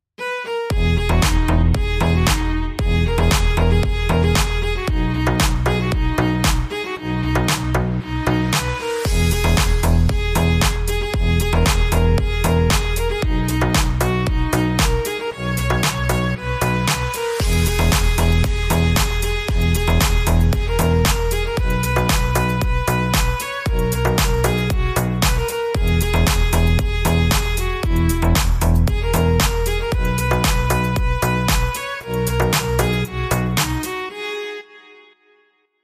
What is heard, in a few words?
Cello Remix